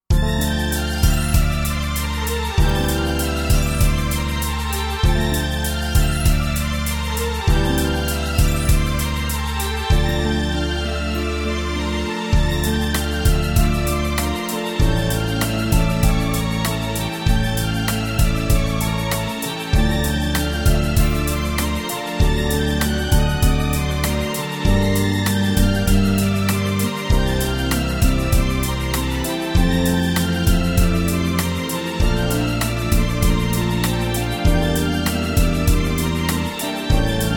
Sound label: brass minus